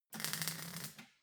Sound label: weld sizzle